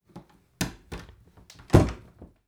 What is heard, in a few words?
DOOR Wood Bedroom Close; don t be afraid the first toy